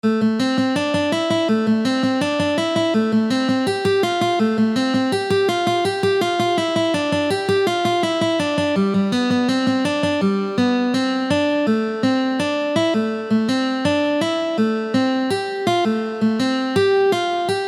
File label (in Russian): na 1 strune